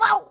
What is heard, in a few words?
Bird Blue Collision; fowl